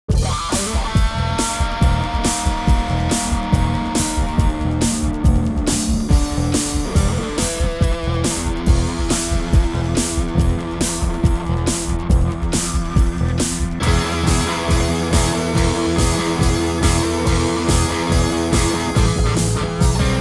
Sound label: Wah Wah Wild